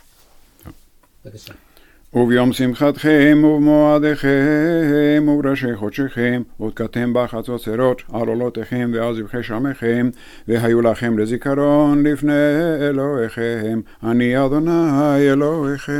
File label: Ubyom simhatkhem kiddush